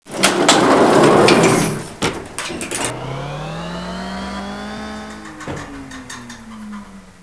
LIFT

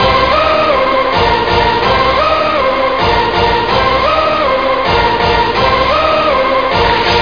TECHNO

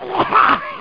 barf; Wired for Sound Pro CD; Aristosoft, Inc.

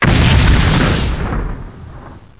NAPALM; Bombic

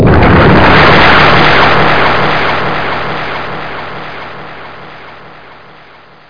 bubling

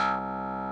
noise

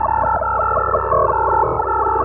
probe hover; MOTS

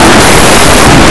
lamp

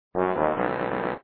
lost Sound; barrels of monkey

